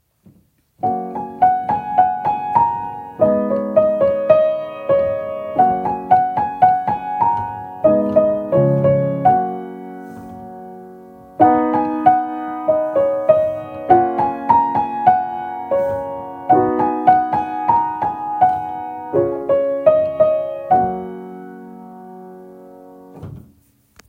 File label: Laid-back morning, piano patterns